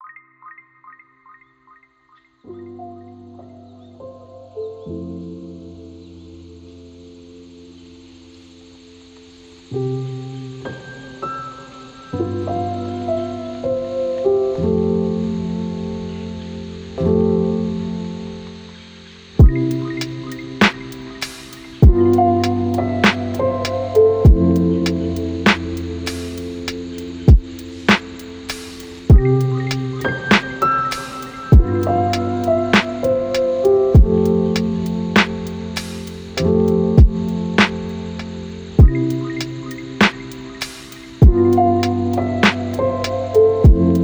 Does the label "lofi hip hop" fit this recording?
Yes